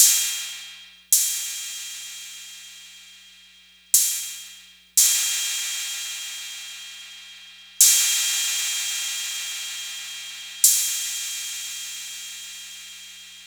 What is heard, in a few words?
808 Cymbal